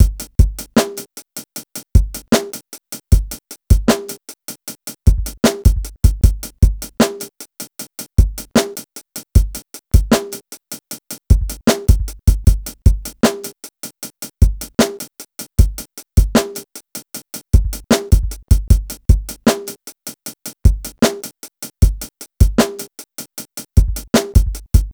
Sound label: Drum Kit, Electro Lounge; Sonic Foundry (Sony Creative Software); 077 Jive Woman